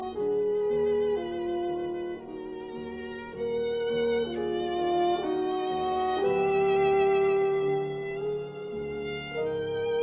Trio for Violin Horn and Piano in E flat major; III Adagio mesto; Brahms; Op 40

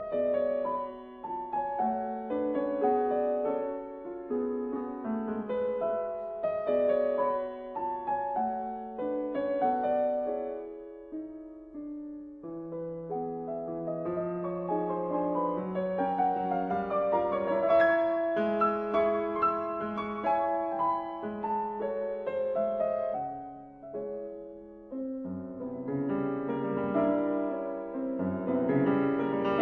Mazurkas in F sharp minor Moderato; 9 Mazurkas Op 25 No 7

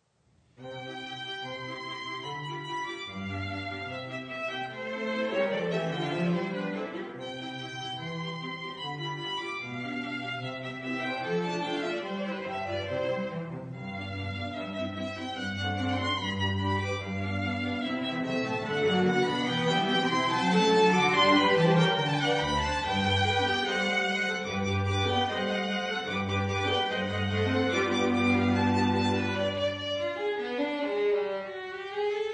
Viola Quintet in c, Allegro

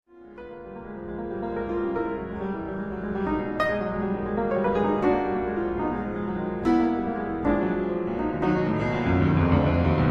Etudes for piano in Eb minor

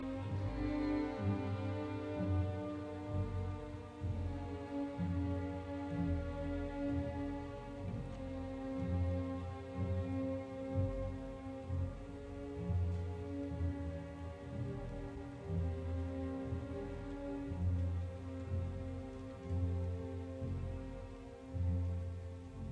Symphony in B flat major, Adagio Allegro; Symphony No 5; WAB 105

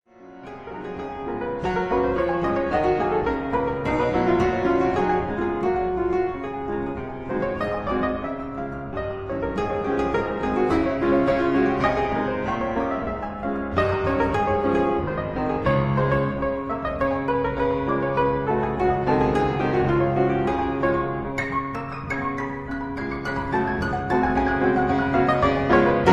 Etudes for piano in Gb major

